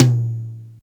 hi tom drum sound a sharp key

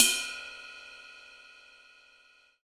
big room crash sample d sharp key; Cymbal; drum sample

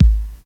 rich low end kick one shot g sharp key

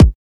bumpin kick one shot a sharp key; kick drum samples

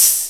good open hi hat one shot f key

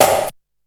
clicky snare drum d sharp key; snare drum samples

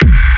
prominent bass drum sound f key

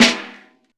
short reverb steel snare drum sound a sharp key

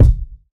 kick drum one shot d sharp key

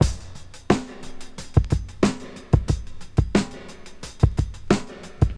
90 bpm modern drum groove b key

